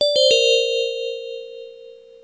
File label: push sound